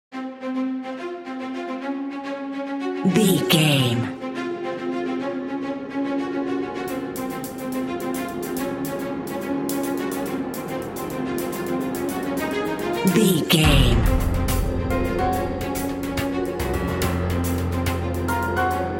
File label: facing enemy